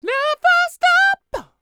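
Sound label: falsetto, R&B Dance; Spectrasonics Vocal Planet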